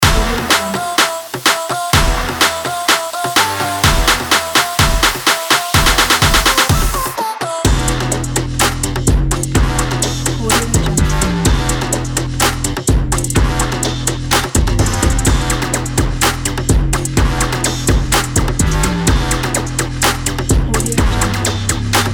energy sports trap brass commercial

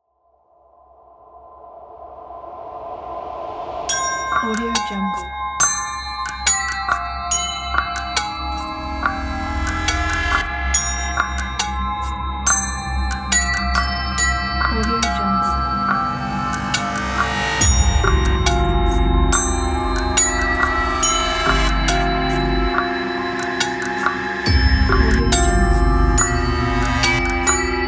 cinematic halloween horror bells

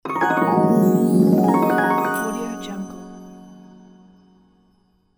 magic wand rain spell; fxprosound

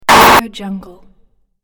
distorted glitch noise

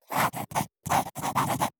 handwriting fountain pen

